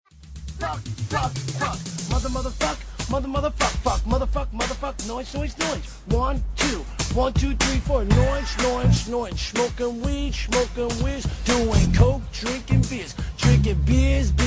rap song w lyrics

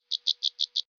Cricky

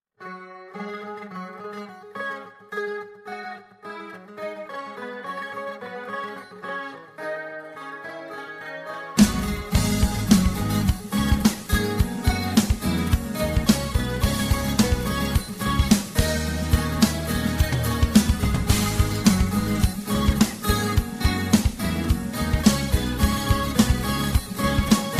guitar, rock; ringtones

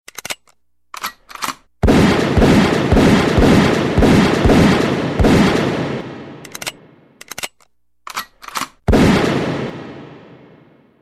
gun loaded fire

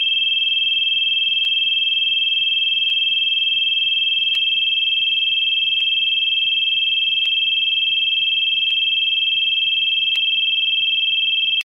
Endless Ringing

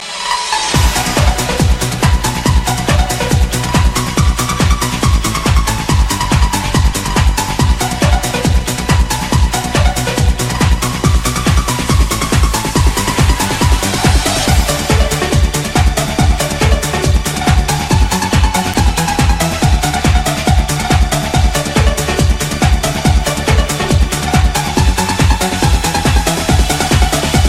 dance

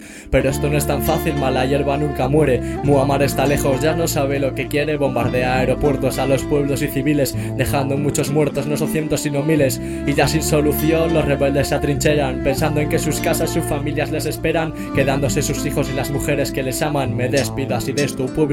Acapella

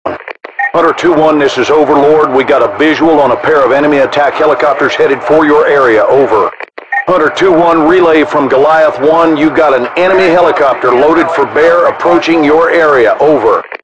sms tone

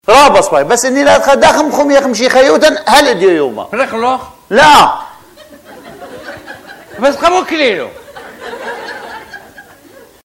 assyrian

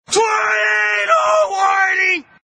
Tornado Warning

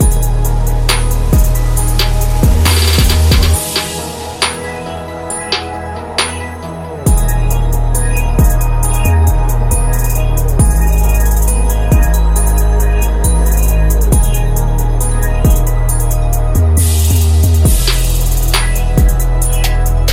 Trippy Hip Hop

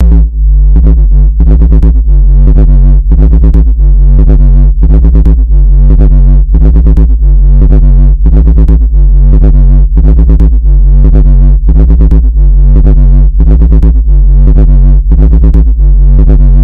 Beat Check

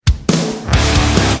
guitar and drums

